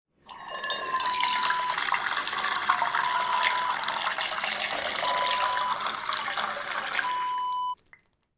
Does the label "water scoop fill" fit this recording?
Yes